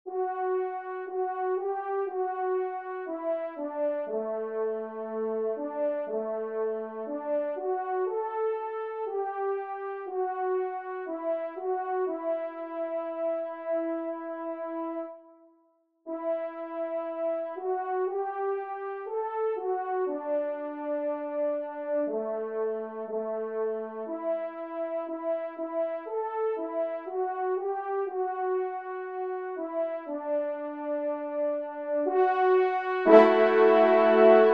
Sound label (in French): Elevation, trompe; Messe de Tyndare